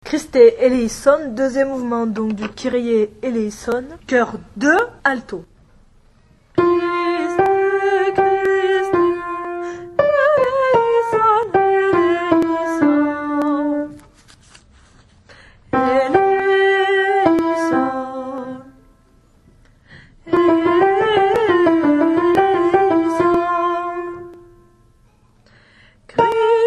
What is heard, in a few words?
Kyrie Alto; Vivaldi 2mvt Nimes